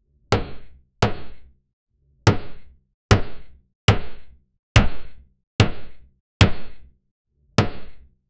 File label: create a violent sound of, slapstick